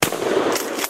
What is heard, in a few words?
m500p fire, Guns